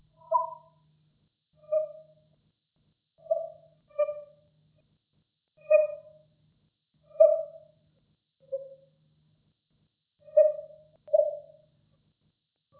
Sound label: cri du plongeon huard loon hoot